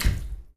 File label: bathtub step; Golden Crayon Sounds